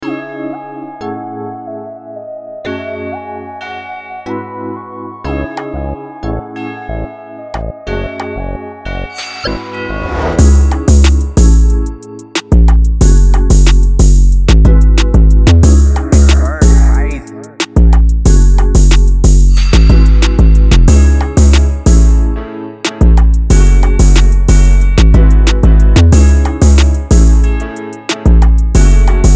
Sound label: jazz on a summer 183 c minor